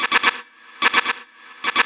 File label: House Loops, Vocal Loops House 128BPM